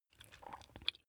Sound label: swallow food